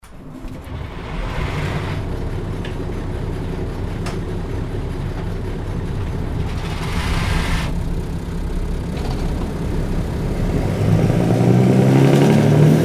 Motor Start Fahrt; VT98; DE

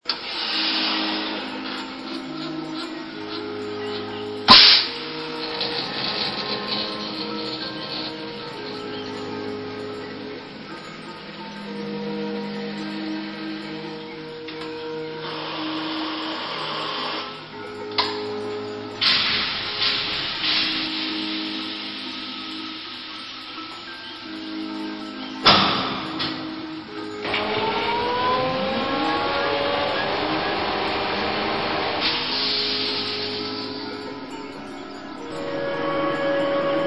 Ge 4 4 III Soundmuster

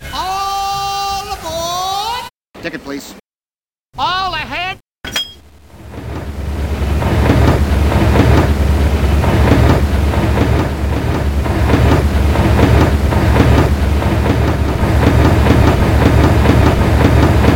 Waggon

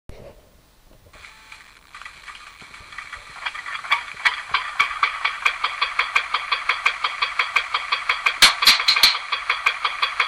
Feldbahnlok klein Klangmuster

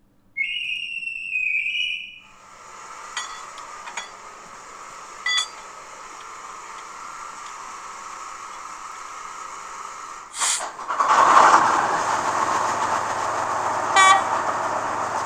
Hoerprobe Reihe 5145

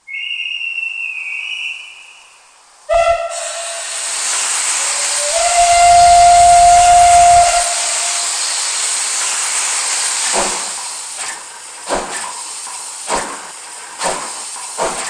Hoerprobe OEBB 52

